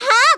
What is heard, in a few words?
kiai; MonsterHunter; Fight